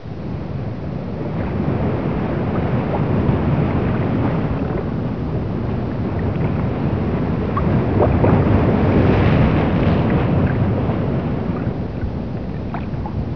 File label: at sea, ambience